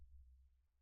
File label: sonar Tail Air Close Beacon; Barotrauma; Real Sonar